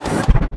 biomech mechanism small

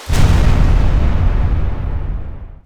waterfall break 2d

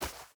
footstep burlap snow run; Rust

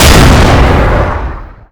abrams 120mm fire; weap abrams120mm fire

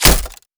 impact glass shield; Escape from Tarkov

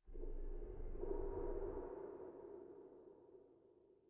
sting metal groan; Rust

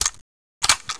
Inf Weapons Foley 3rd; Red Orchestra 41-45

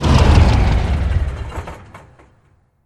BMP Engine Shut Off; DCS

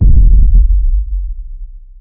explosion rumble; War Thunder

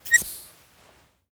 valve open; Rust

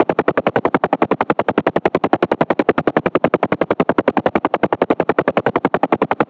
Aircrafts Engines Rotor UH1H Dyn Cockpit; DCS

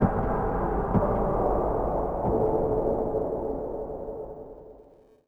city occasional

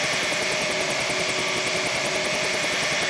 engine idle

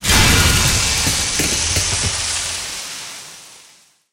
hydrant hit

